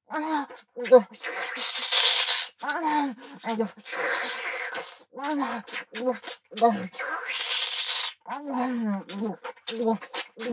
バキュームフェラ・激しい; loop音声

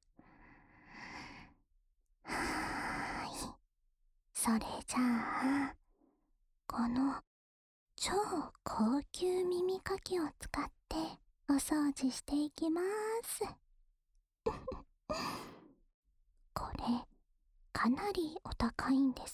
高級耳かき（左耳）, ASMR

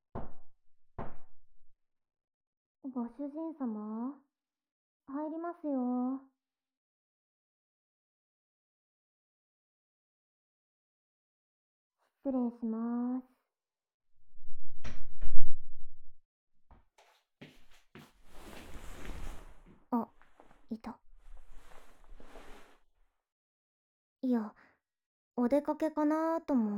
ブラシ耳かきと大き目綿棒

